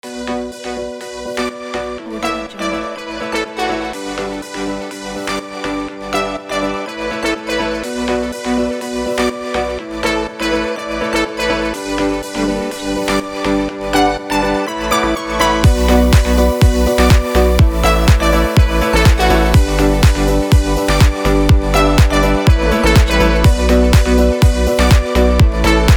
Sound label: house background music